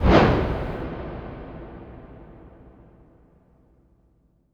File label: Impact Wipe, Cinematic